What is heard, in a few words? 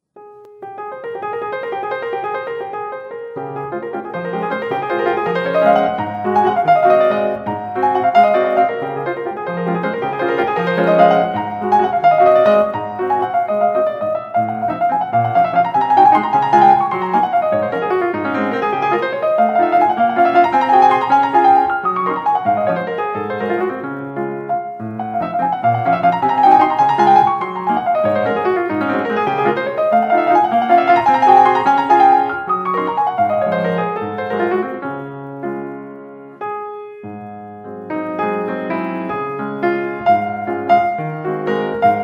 Waltz